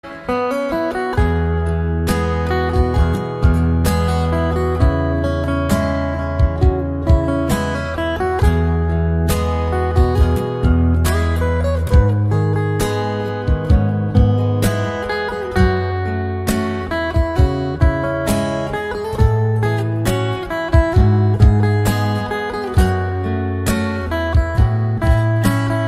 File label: Guitar